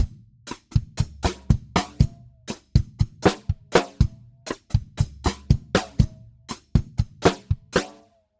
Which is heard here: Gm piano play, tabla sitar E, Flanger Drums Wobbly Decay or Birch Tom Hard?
Flanger Drums Wobbly Decay